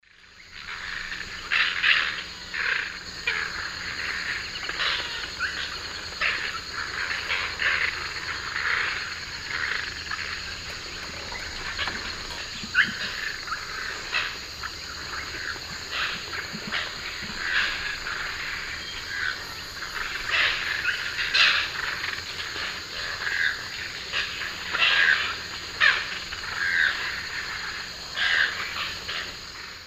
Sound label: Pteroglossus azara, group calls; S. America; Eastern Lowlands